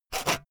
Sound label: sawing short